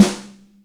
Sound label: Parade S, Acoustic Snare, Yamaha DTXpress